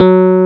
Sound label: PUNCHREEDS; Wizoo - Powered DX